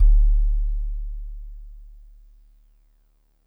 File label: DreamLike DX100 D0